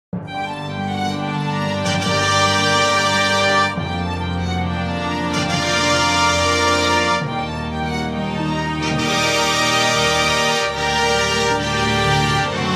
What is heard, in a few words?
Funky Version with Clarinet full tempo 132 BPM; Moto Perpetuo